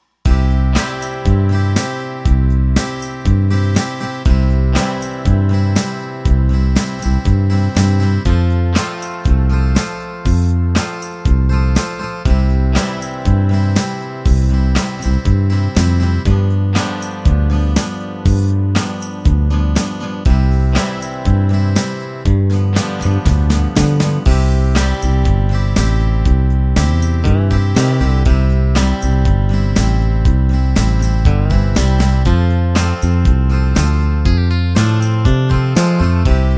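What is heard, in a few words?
Modern Country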